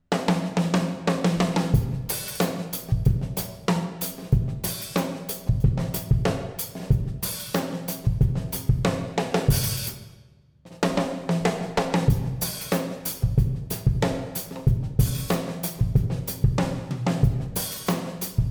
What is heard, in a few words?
Drum Sample